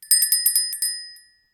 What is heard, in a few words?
hand bell; christmas ringtones